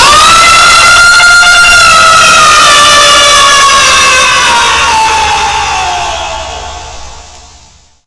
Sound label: jumpscare sound; fnaf plushies 1 v4